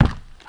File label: Foot Step Orc